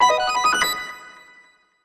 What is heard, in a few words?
Achievement Obtained! Fanfare; 2023; mobile